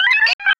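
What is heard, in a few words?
sphere blips large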